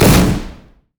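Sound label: fireworks missile barrage; Fireworks Corki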